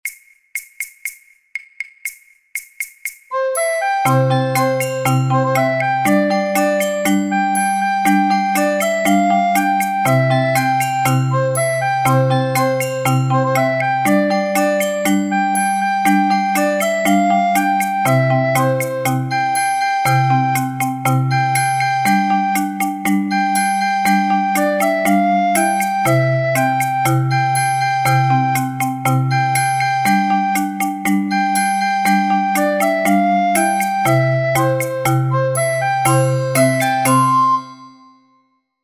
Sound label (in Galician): GALO Conxunto Instrumental